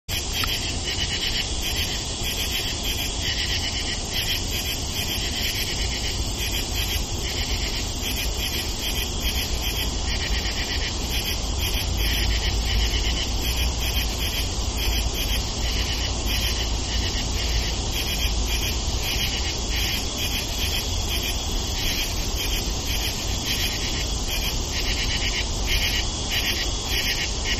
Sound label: Katydids, insects; SBend IN